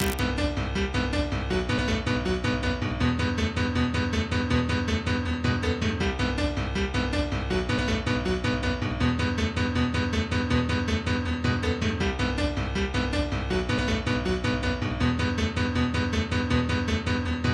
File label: phonk pianino